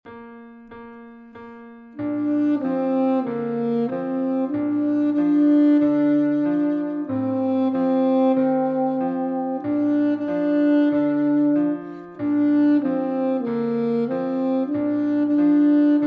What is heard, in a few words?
Alto sax; Suzuki; Mary Had a Little Lamb